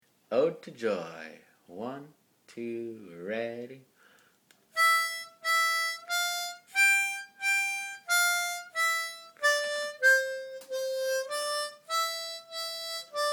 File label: harmonica